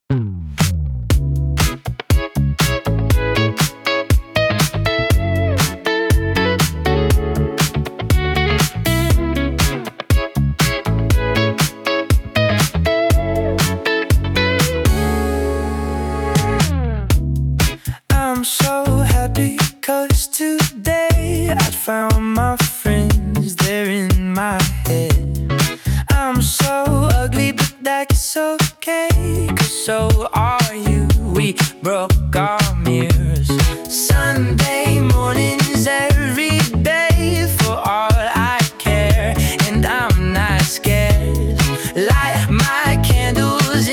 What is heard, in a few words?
groove funky